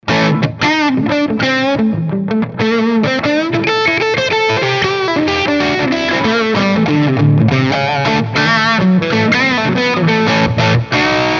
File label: Les Paul